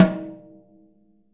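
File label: jazz snares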